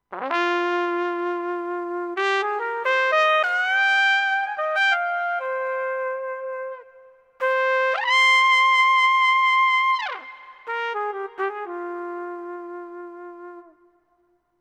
yamaha tyros 5 voices jazz trumpet

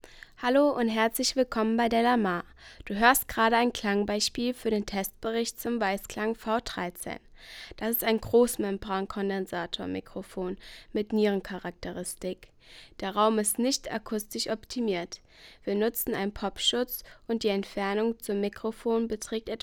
weissklang v13 vocal female 20 cm

